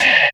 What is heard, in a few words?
Trap Snare; Sonic Foundry (Sony Creative Software); Esoterik Beatz; Trap Kit